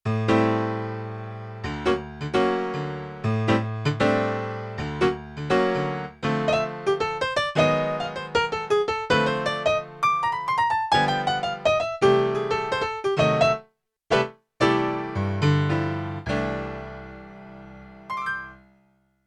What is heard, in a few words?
Jazz Piano; Yamaha TX16W; Jazz Performance